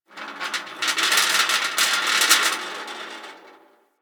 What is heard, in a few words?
Gas City Rattle Metal; Warframe; Corpus Gas City